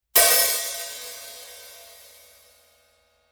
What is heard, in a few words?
hihat 13 hit, cymbal; sabian